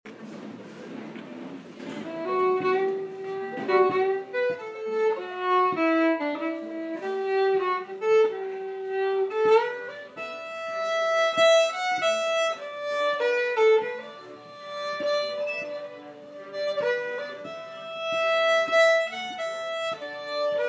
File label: Waltz